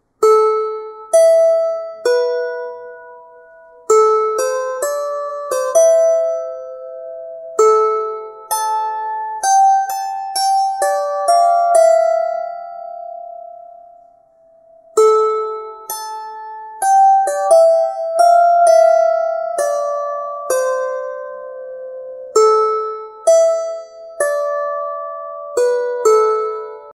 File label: Harp Professional; ساز چنگ